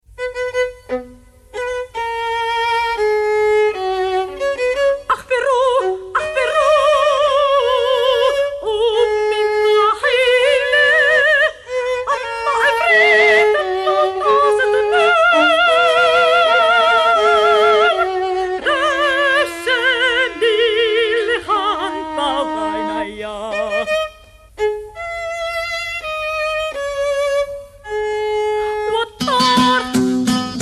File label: soprano, oriental violin and oud; sung in Arabic